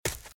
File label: Footsteps - Leaves - Sprint - Right; RadwinIsland